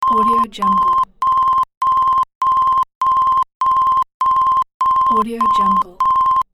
quickly modulated beeps

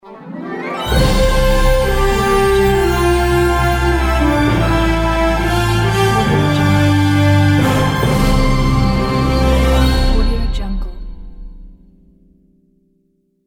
orchestral christmas ident

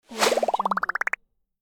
pops up swoosh

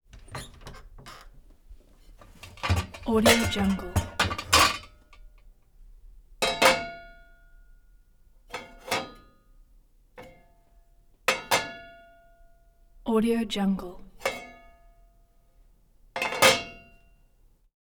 pot remove from cabinet place on stove; prosoundfx

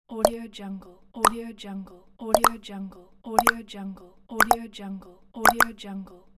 click option

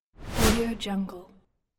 whoosh

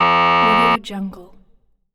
wrong answer

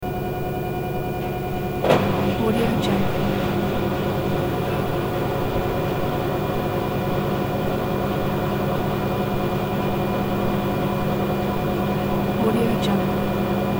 washer loop

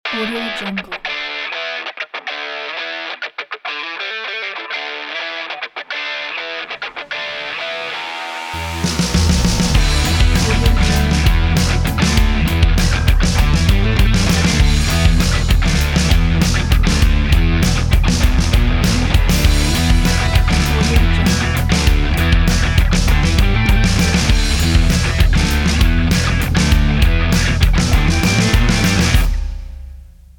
garage rock intro

